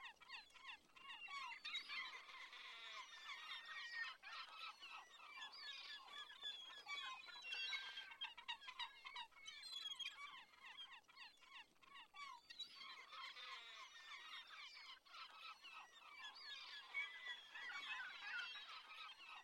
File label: seagulls